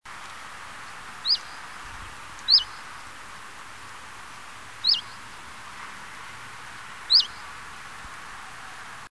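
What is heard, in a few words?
Phylloscopus collybita, Chiffchaff aberrant calls; Mamaia, Dobrogea, Romania 3 April 1999; Linnut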